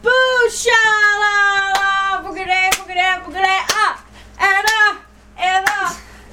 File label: Camp Chant